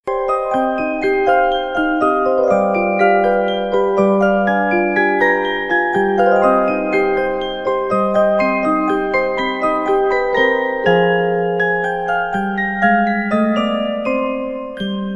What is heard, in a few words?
Ring Tone; SailorMoon